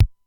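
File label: Simmons SDS-9 bass drum, Drum Machines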